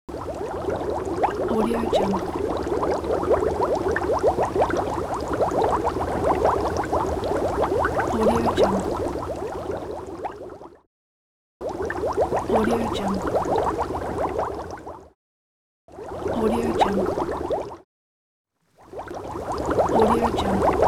boiling cauldron

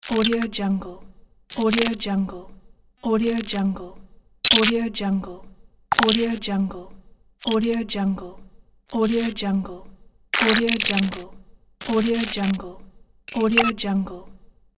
visuals glitches

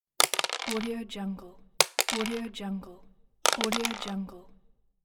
dices

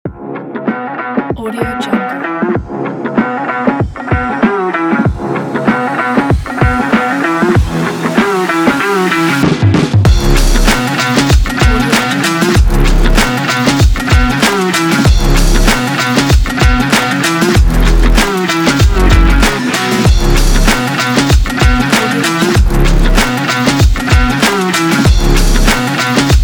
electronic rock beat

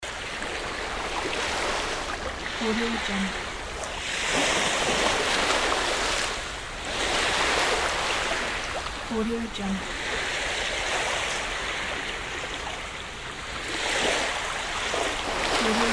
sea waves

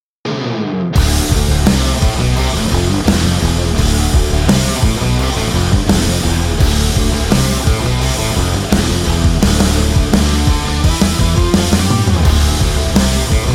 hard rock

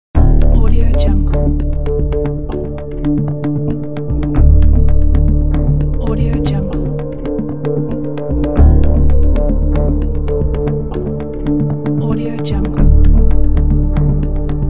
suspense game show timer

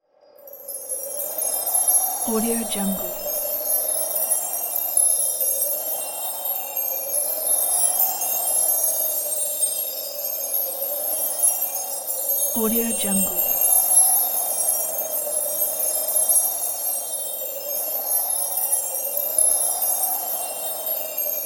magic wind

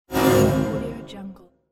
electric pulse graphic imaging impact

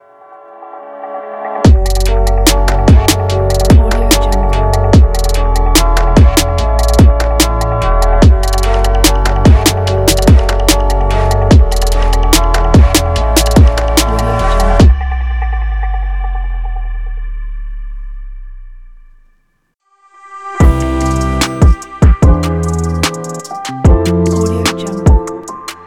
intro hiphop logo pack

